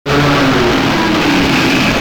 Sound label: Jump scare